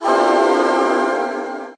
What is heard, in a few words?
Layered Vox, female layered synth vox